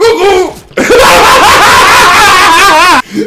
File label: laugh